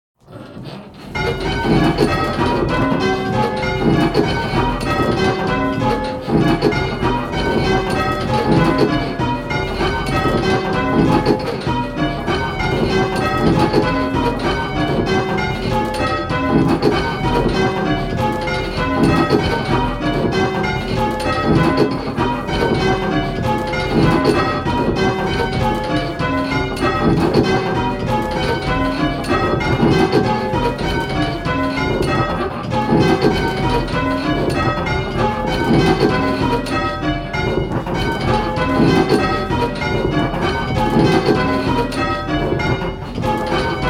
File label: Ionian Little Bob Major, bells